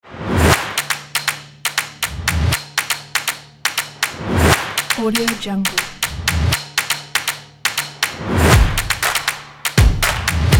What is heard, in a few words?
percussion; projectred